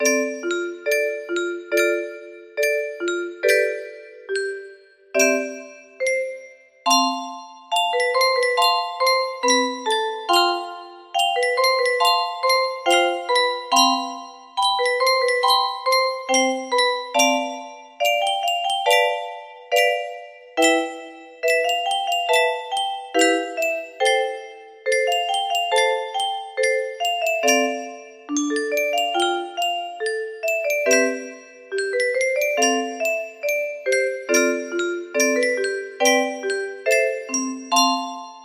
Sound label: melody